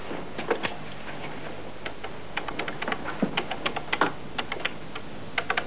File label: PHONE, 电话; 特酷声音素材库